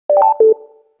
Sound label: ALARM; 8GadgetPack; Digiclock.gadget